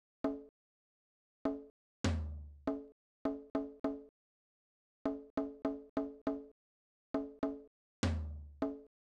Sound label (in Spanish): ritmo